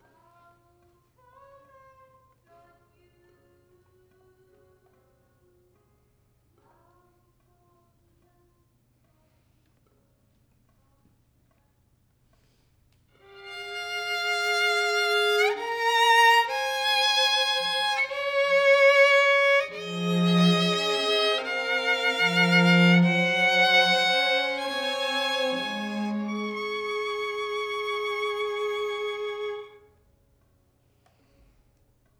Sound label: violin